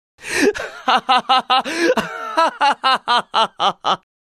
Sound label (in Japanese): エモーション（笑う）「（高笑い）」